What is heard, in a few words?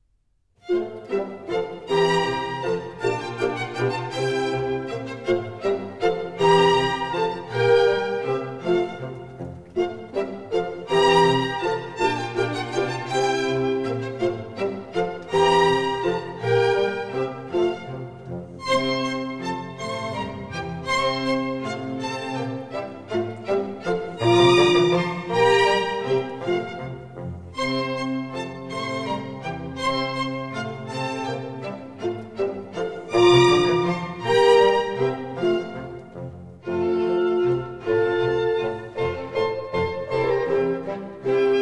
Minuets, F major; Mozart; Dances and Marches